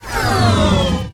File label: Menu Powerdown; Menu FX